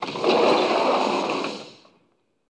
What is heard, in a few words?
Door Metallic Glass, half glass